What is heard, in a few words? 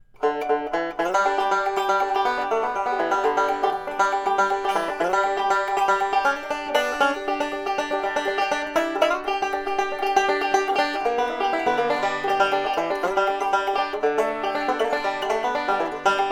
fireball, banjo; Recordings: M-Audio microphone and Fostex MR16 recording studio